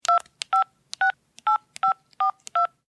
cellphone dial

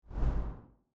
air whoosh

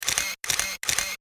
camera clicks

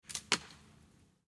golf ball hit tree

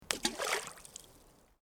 golf ball hit water

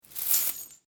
treasure coin movement

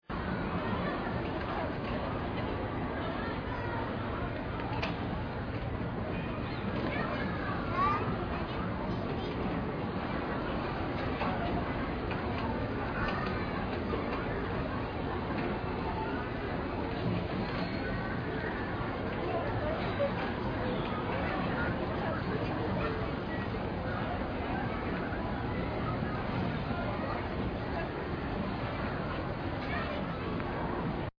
carnival fair

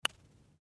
golf club putter

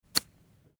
golf club chip shot

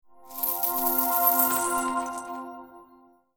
coin magic

